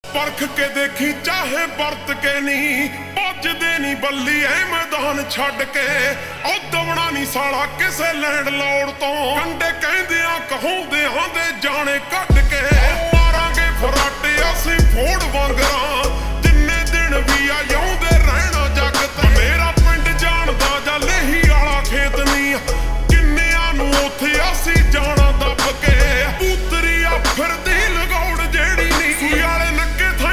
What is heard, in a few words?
Ringtone Slowed Reverb